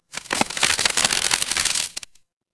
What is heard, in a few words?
paper fabric crumple